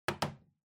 Calculator (Put)

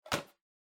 Megaphone Hardware Grab

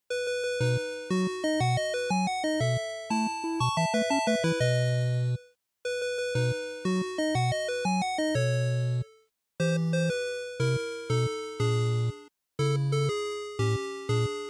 NES General

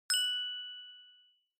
Inspiration Low; ひらめき; 低

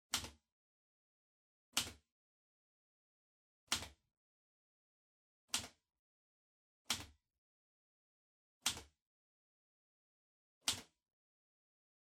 Typewriter Far Type Single

